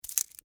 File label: Wrist Watch Up, Foley, Wrist, Watch, Pick, Up, From, Hand; Clocks; BlastWave